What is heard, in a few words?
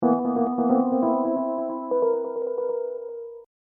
Hip Hop Dark Rhodes Licks 90 Bpm Swing E; Royalty Free Keyboard Loops